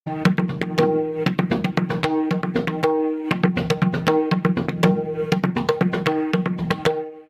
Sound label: pakhawaj; musique indienne